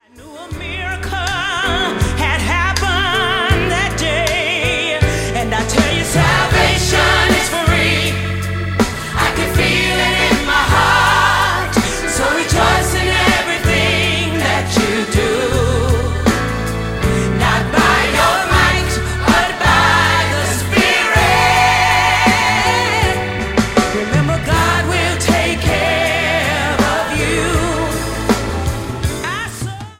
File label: Choir